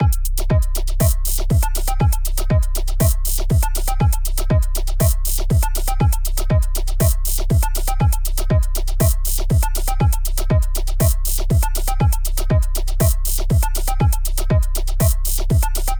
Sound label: Drum Loop, 80s Lead Guitar Delay; feedback echo; Newfangled Audio